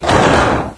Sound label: bridge, ambience